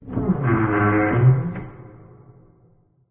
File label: metal randoms, ambience; source engine; portal2